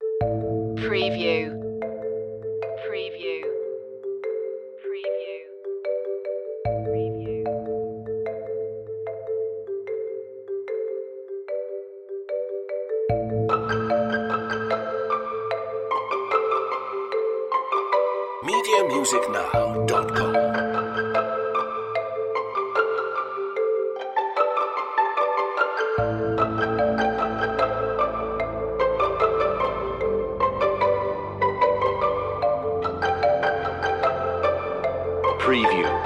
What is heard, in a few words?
redirect no bass drums bells or xylo